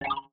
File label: hit Alien